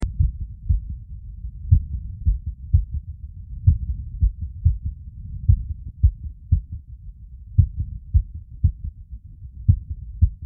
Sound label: SINUS BRADYCARDIA, heartsound; Heartsound Library